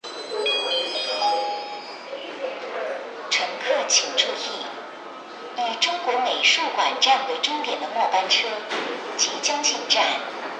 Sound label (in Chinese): 8号线 中国美术馆方向 末班车